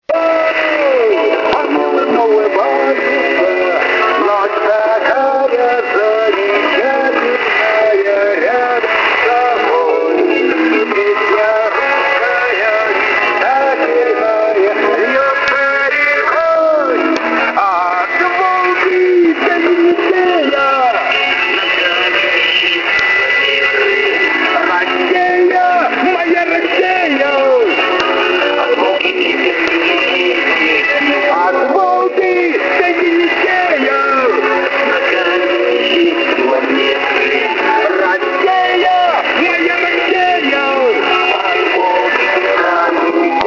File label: sw russian propaganda song